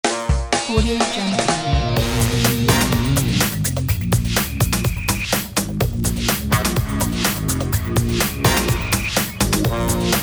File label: opener energy